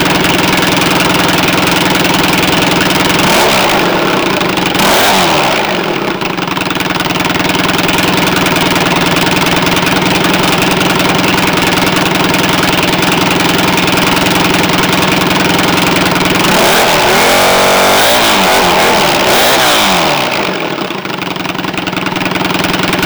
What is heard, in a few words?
vf700c; laser